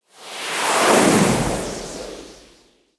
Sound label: windy